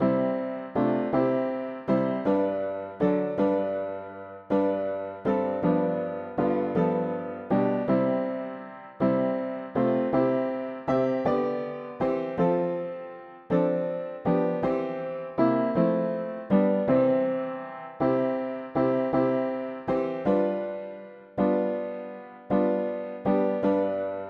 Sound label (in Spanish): mono piano; hymns; Himnario pequeno